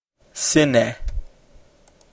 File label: sine